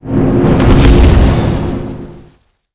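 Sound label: ghost house death, monster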